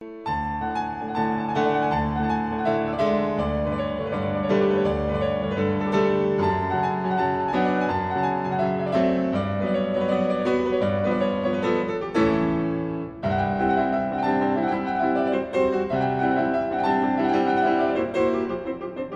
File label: Fast Dance, Piano; 6 Roumanian Folk Dances, BB 68, Sz. 56; Bartók